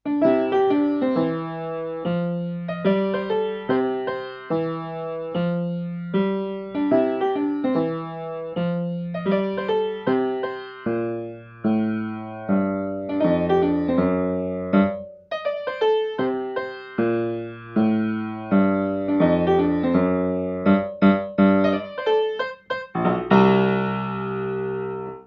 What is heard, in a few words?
blues